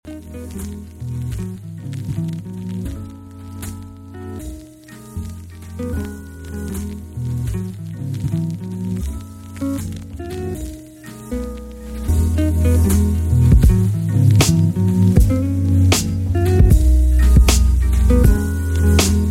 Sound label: Dusty Grooves (Instrumental)